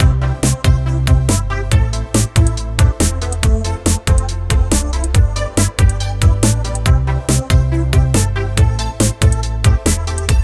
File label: Stage Casual Long Loop; Imphenzia Soundtrack Once Upon A Platform Level Kit; percys predicament deluxe; 3ds switch